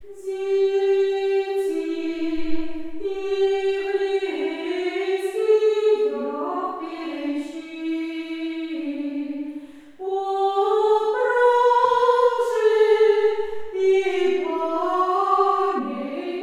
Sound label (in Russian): Преображение. Ирмос 7 песни второго творца; дети еврейския